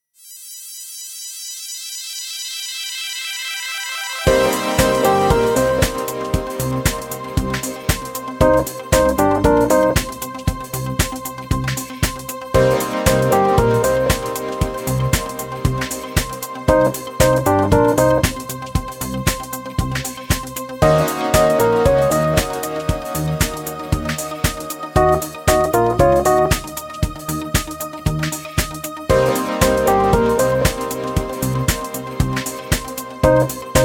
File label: chill house music ringtone